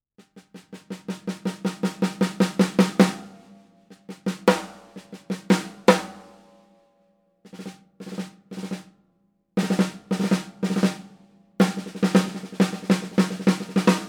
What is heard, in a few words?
Maple BB45; Craviotto; 6.5x14